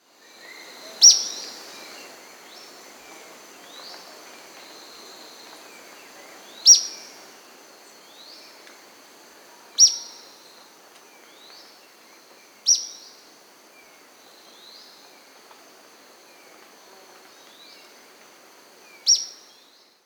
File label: Ornate Flycatcher (Myiotriccus Ornatus), Andean Birds; S. America